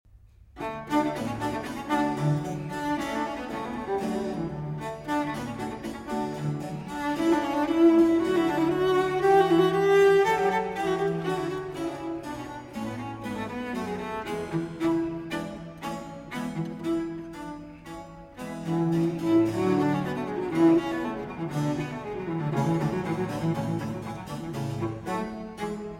Cello Sonata in E-Flat Major; Allegro; Vivaldi

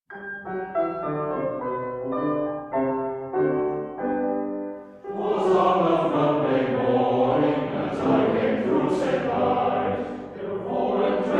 Male Voice Choir; Climax Male Voice Choir; The Skippers of St Ives

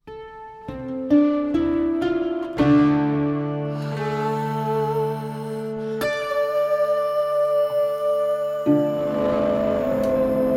Instrumental Version

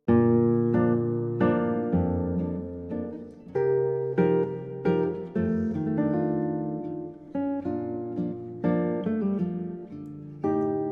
Valses Poeticos, Allegretto (Elegante); 8 Valses Poeticos; No. 5; Arr. Raphaël Feuillâtre

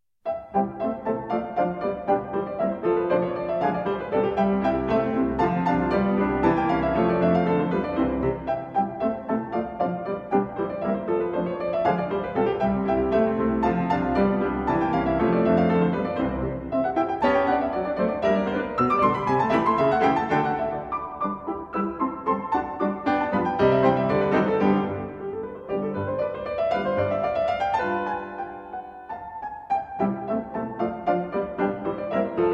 Piano Sonata in C Major, 2 Pianos; No. 16; K. 545 For Beginners, EG 113c; Arr. For 2 Pianos By Edvard Grieg

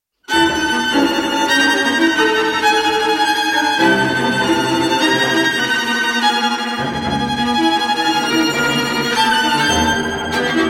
String Quartet

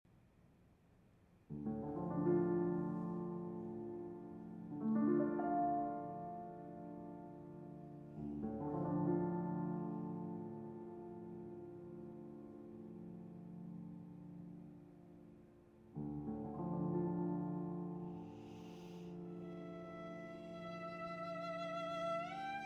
Violin and Piano; Sospiri, Op. 70

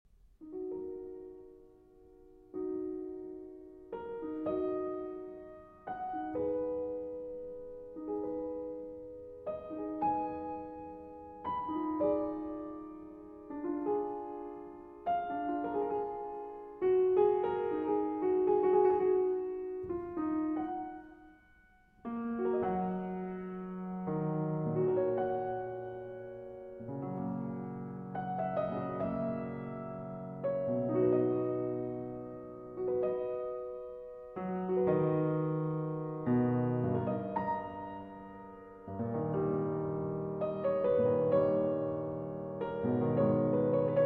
Prelude in C Major

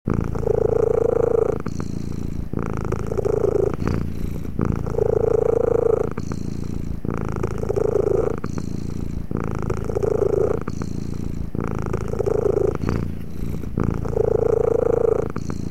Cat Purring Brighter & Breathy (Loopable)

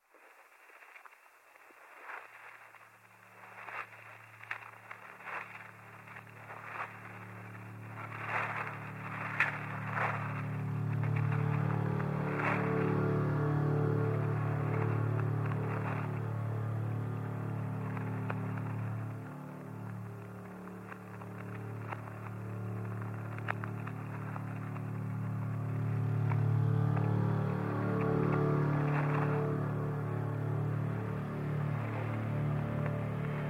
Piano Noir

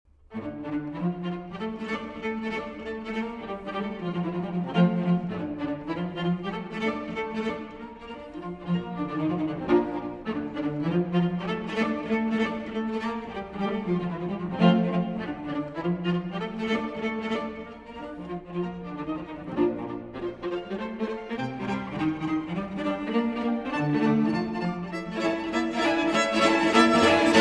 String Quartet in D Minor, IV. Presto

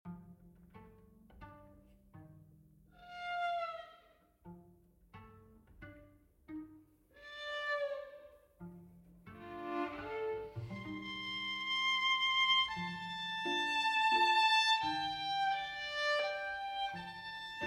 String Quartet in D Minor; 3rd Movement

